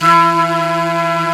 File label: Flute, Singin' Flute